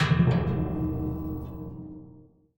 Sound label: Round Start Neutral